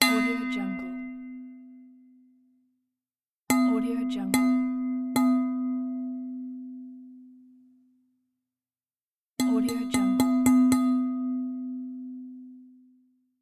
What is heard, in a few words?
the knocking on glass